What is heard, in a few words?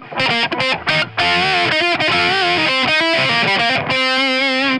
Guitar Solo, metal; Метал